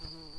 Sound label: bumblebees, Lucorum queens